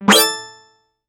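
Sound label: satisfying dopamine inducing, sparkle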